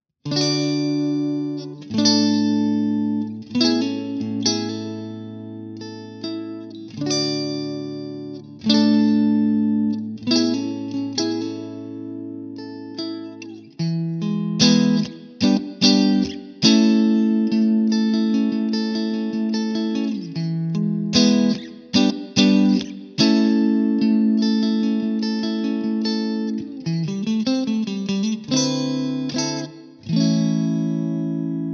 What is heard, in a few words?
clean; jensentone